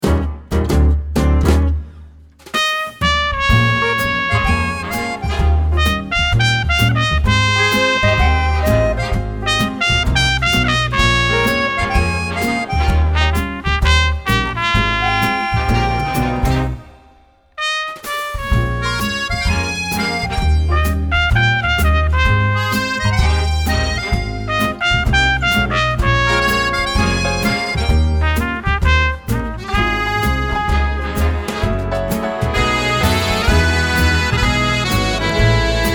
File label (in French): trompette accordeon